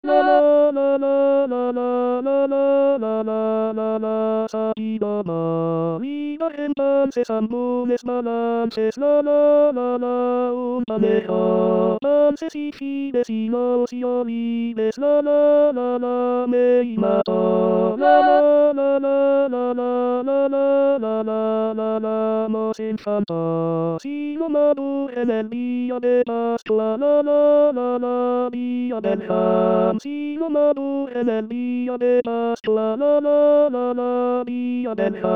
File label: tenor; el noi de la mare